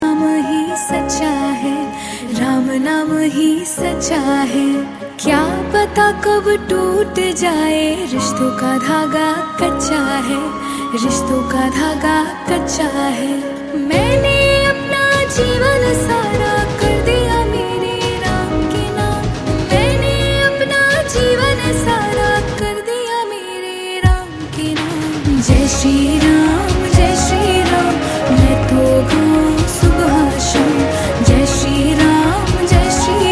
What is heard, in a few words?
bhajan